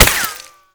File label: impact dirt; weapons; Modern Warfare